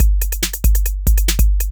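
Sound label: DRUMLOOP ELECTRO 140; Techno2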